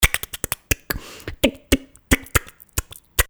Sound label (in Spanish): ritmos